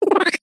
cartoon laugh mouse high pitched

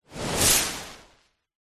foley tree leaves branch whoosh impact designed

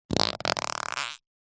human fart forced weak thin

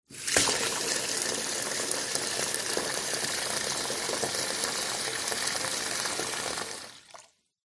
household water from tap into full plastic bucket

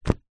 household book very small set down or drop; zapsplat

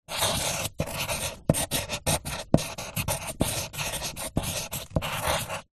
office pencil paper hollow table drawing; zapsplat

